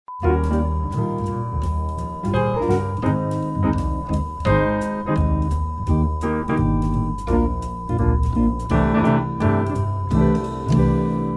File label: groovy jingles